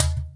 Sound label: verby cajon high